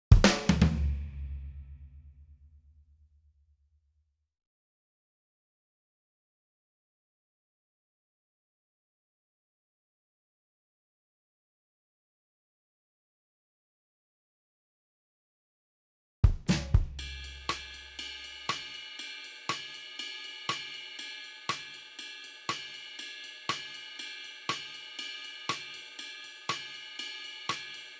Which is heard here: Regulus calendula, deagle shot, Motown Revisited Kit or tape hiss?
Motown Revisited Kit